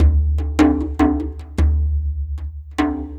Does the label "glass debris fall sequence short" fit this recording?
No